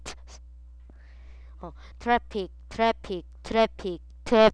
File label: traffic